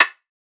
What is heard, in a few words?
perc warm rim, Drum One Shots; SD MIDNIGHT Sample Pack; Slate